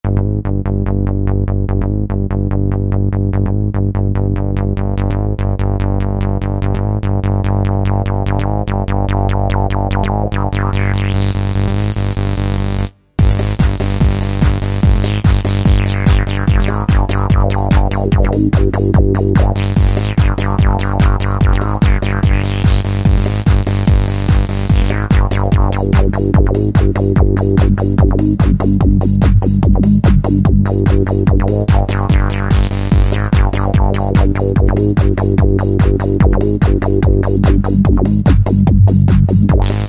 Acid Syn; Yamaha YPT-400; Voices

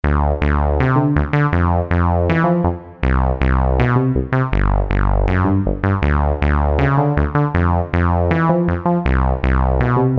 Rubber Bas, Roland SC-880; Factory Tones

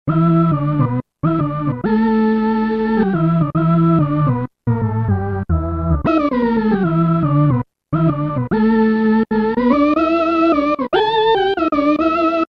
Child Organ; Roland M-DC1

